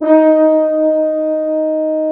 Orchestral Brass

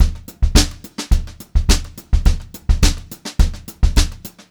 beat, Brushed Drum Loops

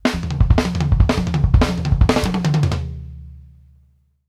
DROPS R, Drumworks FILLS 84; Sampleheads; New York City Drumworks VOL-1; KD FILLS 84

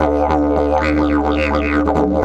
108, Didgeridoo

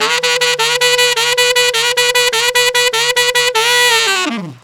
Tenor in B, Tenor Sax Loops; Sonic Foundry (Sony Creative Software); Jazz Solos and Sections

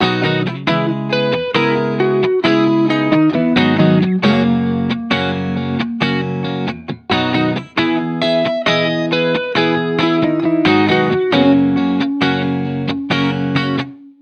Guitar, 135 bpm, key B, Back to the 70s Guitars; Fox Samples; Full Mixes

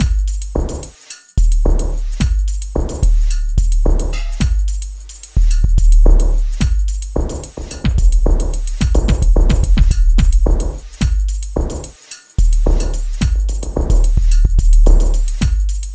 Beat, Drums, Eclectic Electronica

